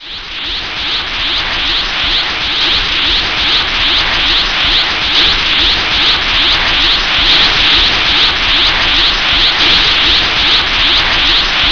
powerup

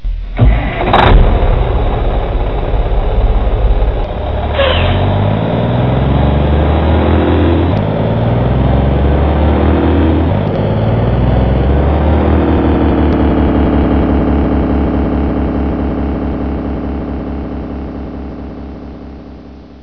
truck sound

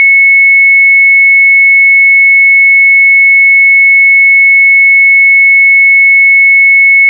flatline

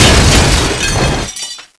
crash sound

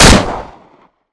famas shoot, weapons

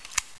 rail clip release

